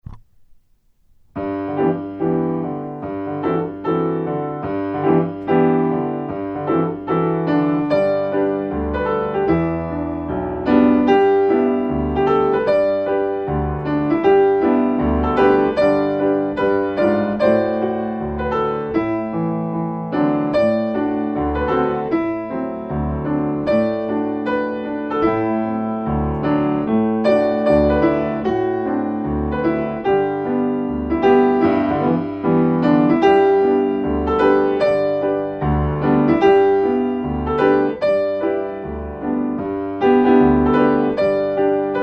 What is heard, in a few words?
singing accompaniment